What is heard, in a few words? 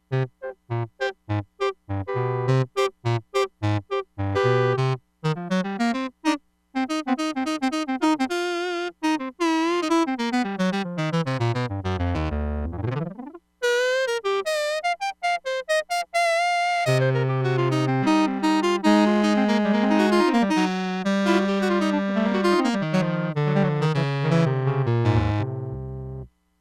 DIRTY REEDS, Crumar Bit 99; Factory Programs